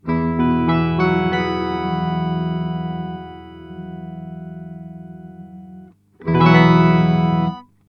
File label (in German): sub und upper Octave; Mooer Tender Octave X2